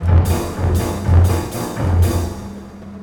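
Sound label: fairground 116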